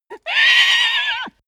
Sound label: horse neigh loud; natur-tiere; pferde